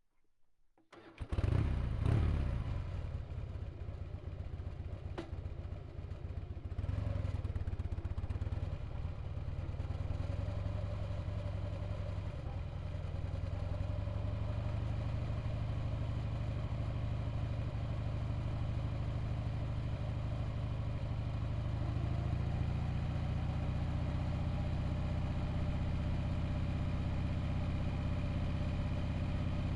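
harley davidson softail onboard steady rpms start drive stop off engine center; mkh8020; Sonniss GDC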